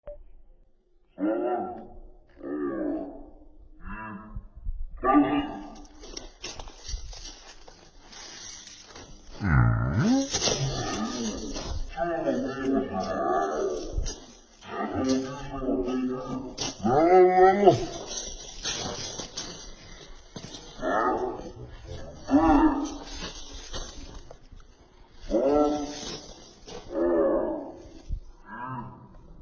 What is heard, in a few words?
laugh; breadradio